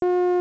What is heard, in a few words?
TTS Beep